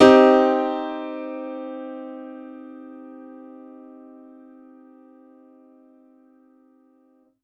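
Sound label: Piano Grand minor hard; TrashLibrary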